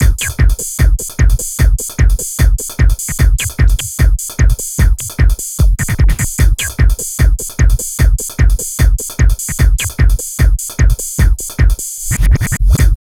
TRANCE, Tekkno LOOPS 150; TRANCE RAPID; Masterbits - Tekkno Trax

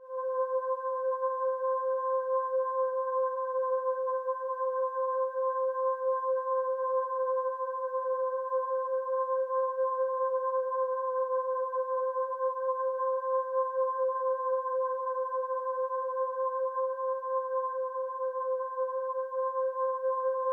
CHILD VO, VOX STYLE, Ominous Drones; USB Soundscan vol.48; AKAI

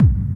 DARK DRUM; Best Service Dream Experience

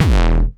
909 Grime Layer E1, Kicks; Drums; Mbase 11 Kicks

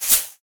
Household Shaker, household rhythm samples Hits

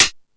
Thundadome, E-MU Pro-Cussion

